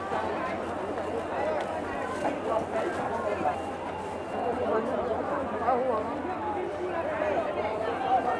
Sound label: people; Mafie 1